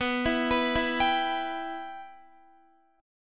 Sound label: B Suspended 4th Sharp 5th B Guitar; Bsus4#5; arpeggiated